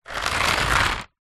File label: office pencils in plastic rack hand roll over